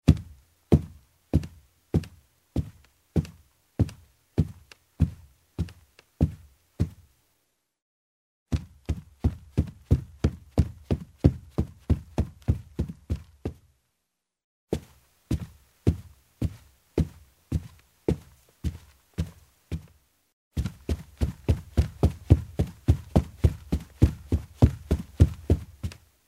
Steps in shoes on a wooden staircase covered with carpet